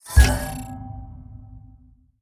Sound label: Health pickup; Unity 2D Game